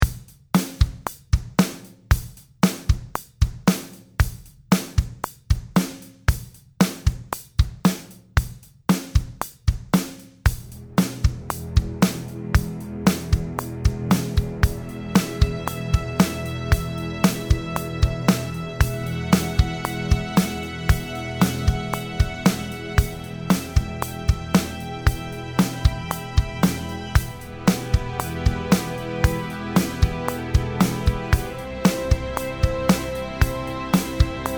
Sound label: metronome drum no piano